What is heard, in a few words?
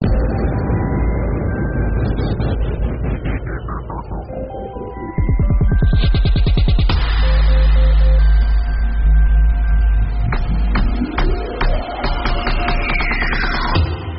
Samsung Galaxy M20, ringtone